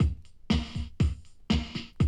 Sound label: 120, Classic Drum Loops